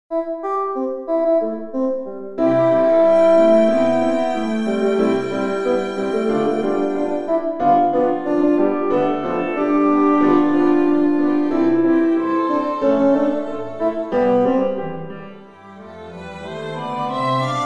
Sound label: foxtrot